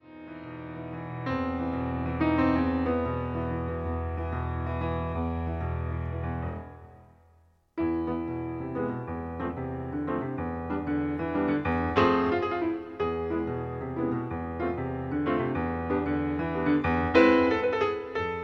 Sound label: Piano solo; Hava Nagila Fantasie